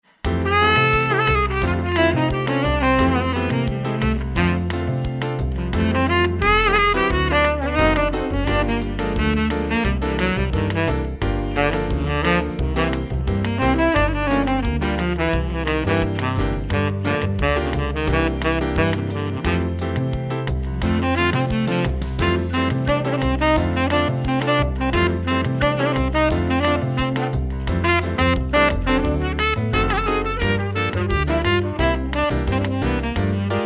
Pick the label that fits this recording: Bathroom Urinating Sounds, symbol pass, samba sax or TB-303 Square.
samba sax